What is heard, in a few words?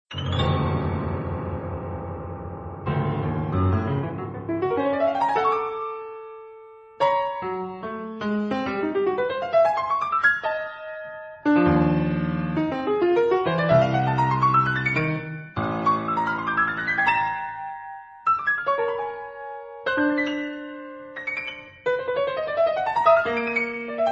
piano solo